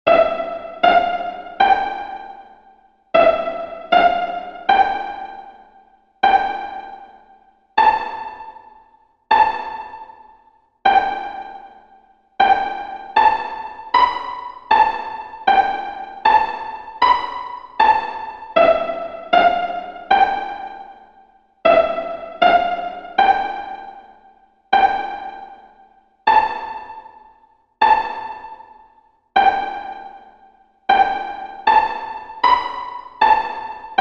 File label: Second Position Exercise 80bpm, mandolin